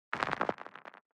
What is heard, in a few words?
stone Pebbles